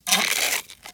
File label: dry ice sizzles